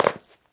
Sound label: concrete land; Golden Crayon Sounds